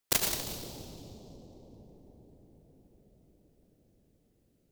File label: DAMPED CAVE, Impulse Response